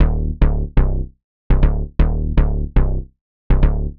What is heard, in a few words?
Digi Bass (120BPM), retro house